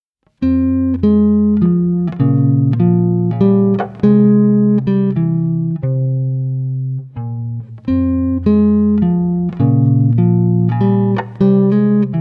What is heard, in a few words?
Solo Pieces For Bass Guitar